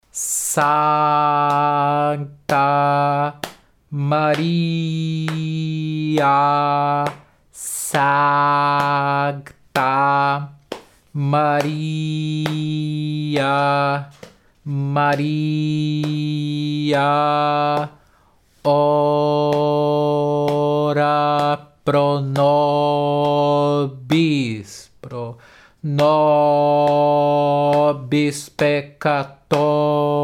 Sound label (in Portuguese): coro; ave maria gounod; leitura